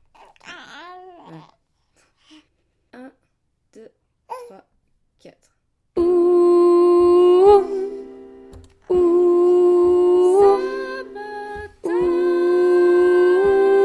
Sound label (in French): hommes